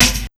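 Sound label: ROCK 132 snare drum, Big Beat Drumloops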